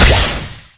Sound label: hit; 3rd degree; video games; source engine; tf; weapons